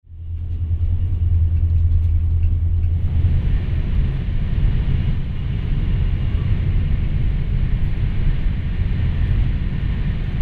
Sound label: train interior pass